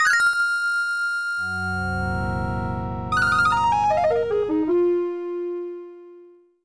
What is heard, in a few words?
War Drum; Strider 2; PlayStation